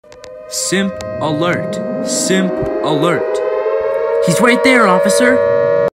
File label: simp alert